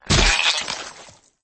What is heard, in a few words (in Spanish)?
caida